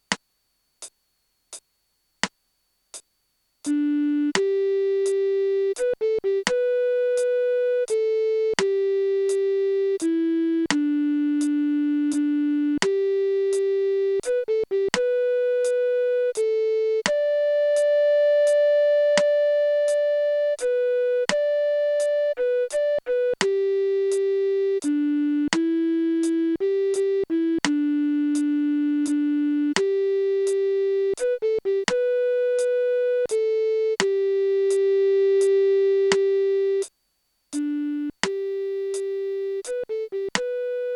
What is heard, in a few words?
Melody Only